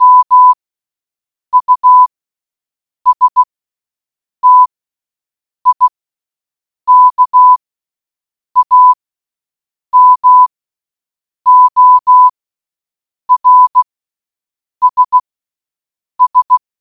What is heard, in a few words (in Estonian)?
morss